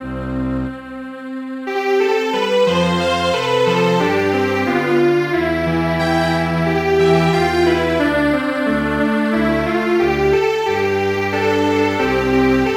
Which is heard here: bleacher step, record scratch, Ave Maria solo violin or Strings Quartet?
Strings Quartet